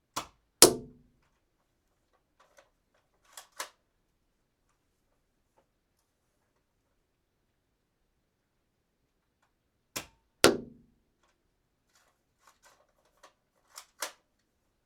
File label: gun shooting cocking darts